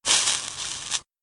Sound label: Fire extinguish